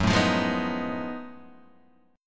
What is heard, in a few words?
F sharp Minor Major 13th, Guitar DADGAD